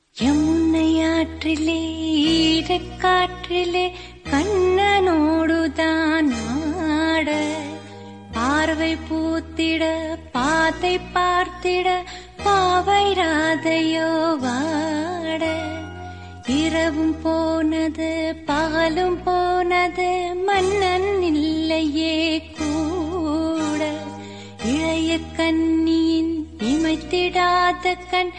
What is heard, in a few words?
Bhakti Ringtone